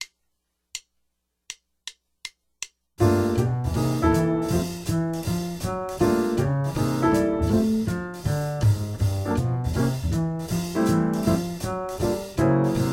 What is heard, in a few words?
Gm7 - C7 - Fmaj7 (2 bars) - 160